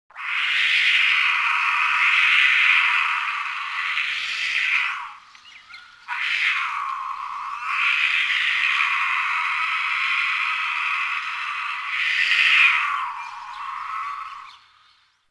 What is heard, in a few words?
Female Cougar in Heat